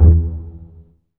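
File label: bass pizzicato E2, Virtuoso Strings; ILIO; Kirk Hunter Virtuoso Strings VOL-3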